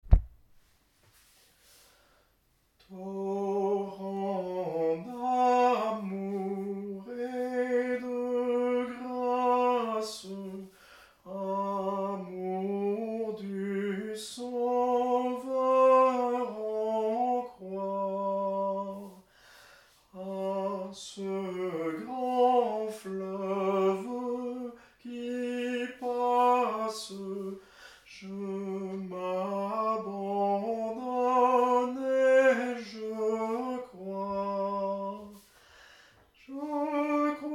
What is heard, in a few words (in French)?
TENOR, atelier de chant a plusieurs voix